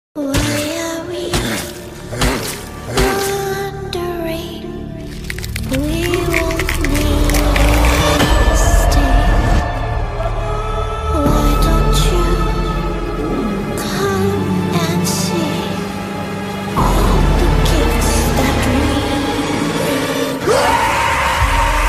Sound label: bgm ringtone tamil horror movie bgm ringtone; demonte colony 2